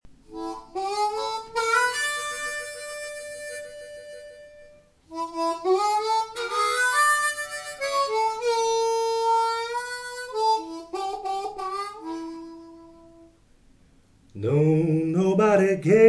blues

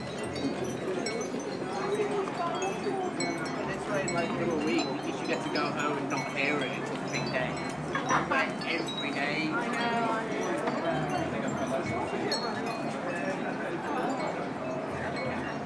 xmas market windchimes; leeds

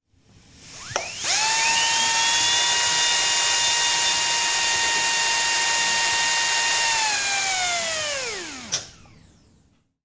fast air turbine handpiece

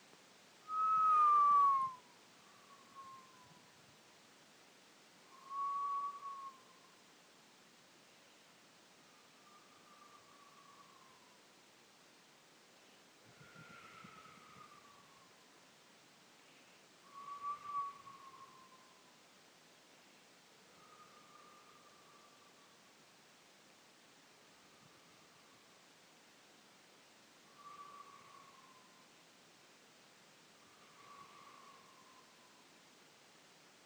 wind soundscape

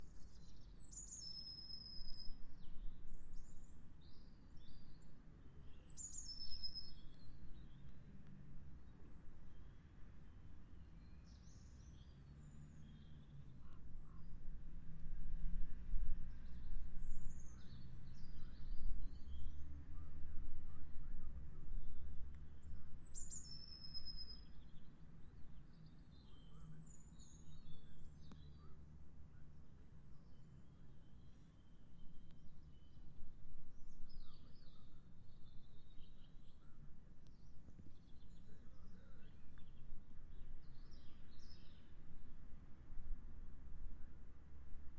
Lots of birdsong, DIY noises and squeaky window; anyone know what bird at start is

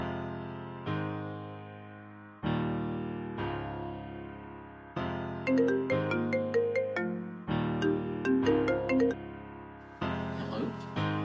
metal version

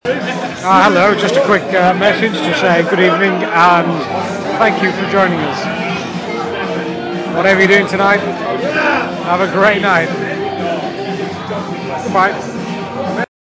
pub

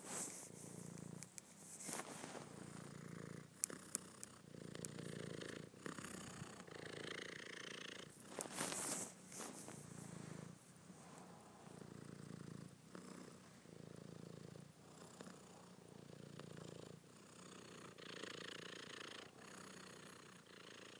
my cat purring; mia

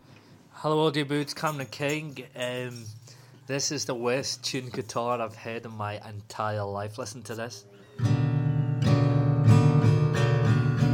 possibly the worst tuned guitar in the world

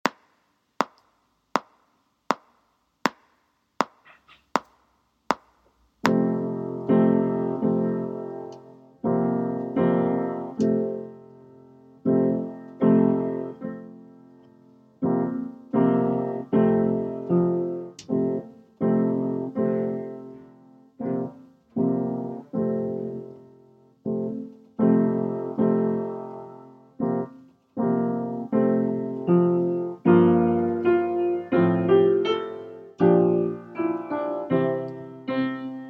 prelude for c clarinet piano only

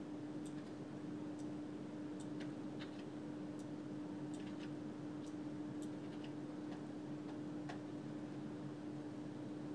at work typing

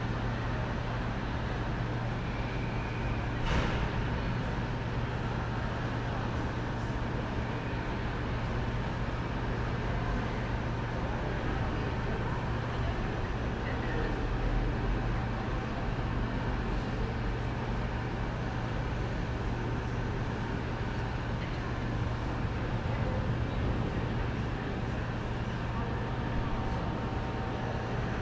wind noise test

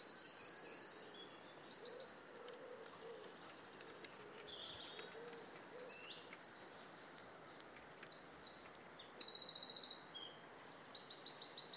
bourne woods

